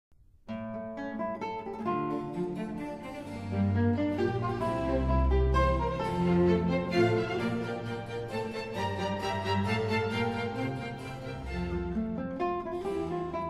Concerto in D minor for guitar and string orchestra; Baroque guitar concertos; Baroque Guitar Concertos- Vivaldi, Handel, Weiss, Fasch